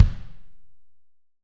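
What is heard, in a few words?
AMB KIK, ambient kick, studio; AKAI S6000 CD-ROM - Volume 3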